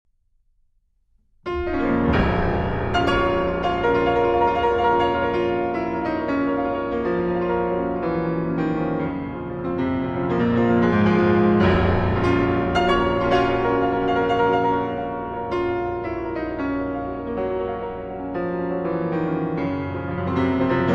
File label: Piano Sonata No 2 Allegro Agitato